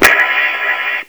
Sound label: blast rifle, Lazer Tag